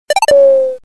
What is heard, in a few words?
heal Fail